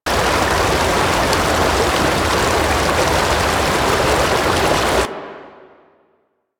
Forest Waterfall Medium; Ambience; Props; Warframe; Grineer Forest

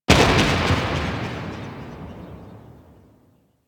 TNT Explosion; DE; Warframe; Weapons; Archwing; Powers

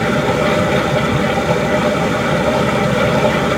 Water Through Pipe Large; Ambience; Warframe; Grineer Galleon

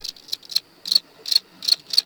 Forest Animal Close; Ambience

